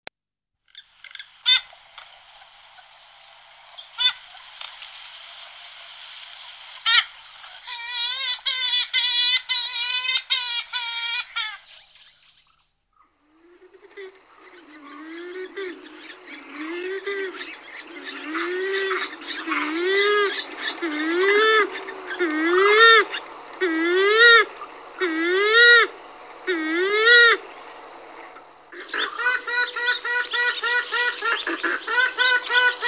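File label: sultanka voice, purple swamphen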